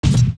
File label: Fall Down Wood; Link